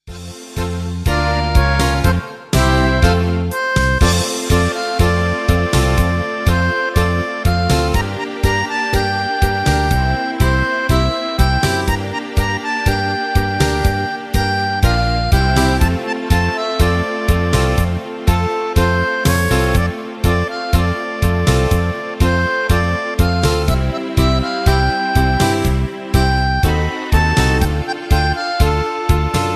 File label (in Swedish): tango kavaljeren